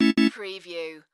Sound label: Casino Slot Machine Organ